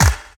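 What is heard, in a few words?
snare drum bass drum, Dance Mega Drums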